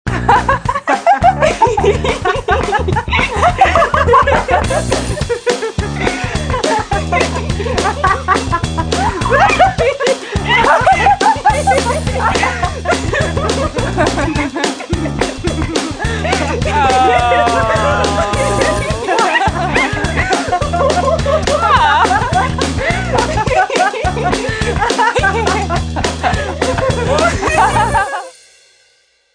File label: Giggles; Motorola E398; Hijacked by MTV